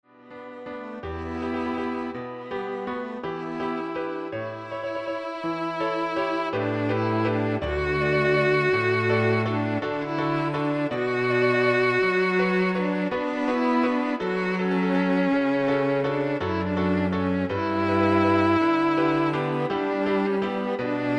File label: Piano Trio